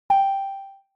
combo break; [DT] - fwootable

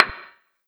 menu hit

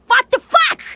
failsound; morgan spring

